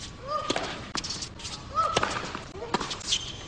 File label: tennis grunt